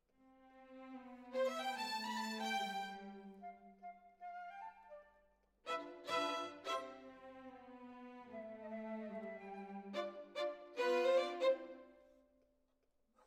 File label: Violin Solo